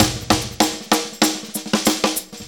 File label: Fills; AMG; Now CD-ROM (Roland)